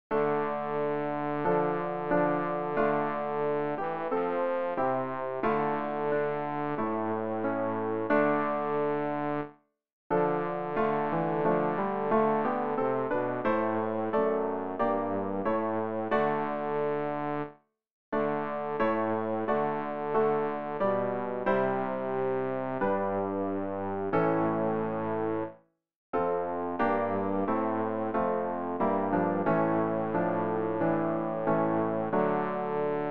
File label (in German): bass; Ref. Gesangbuch; RG 590: Hinunter ist der Sonne Schein